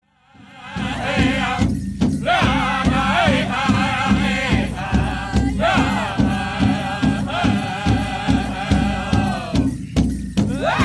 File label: Men's Traditional